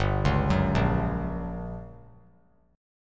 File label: G Minor Major 7th Flat 5th, G, down, 3C 3F 3A 3D; arpeggiated; JGuitar